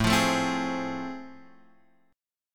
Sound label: A Minor Major 7th Sharp 5th, down